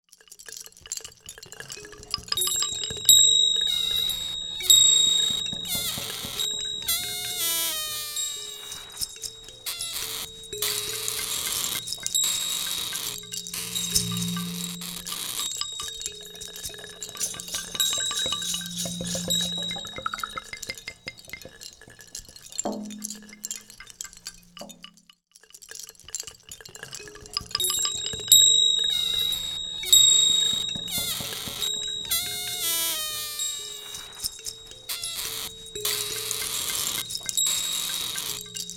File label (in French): boucle foret